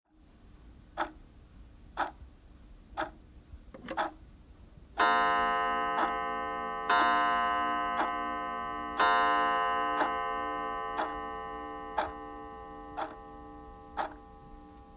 Pendulum Clock; پاندول ساعت

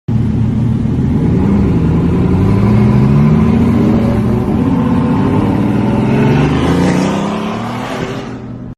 Bugatti Veyron

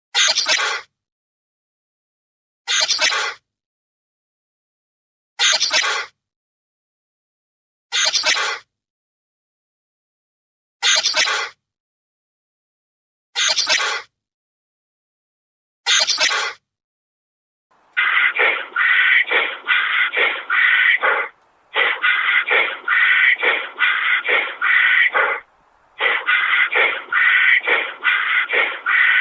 CHAMELEON SOUND EFFECTS

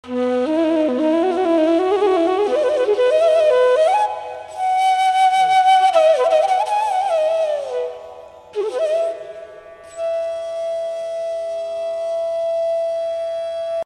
Bansuri; فلوت بامبو هندی

